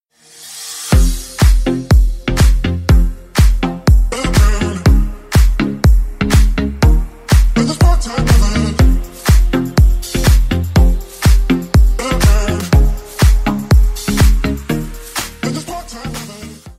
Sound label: deep house